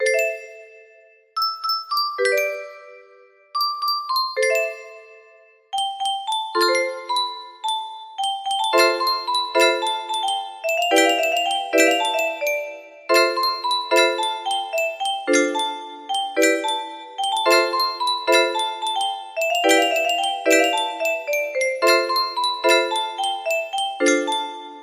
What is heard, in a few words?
melody, music box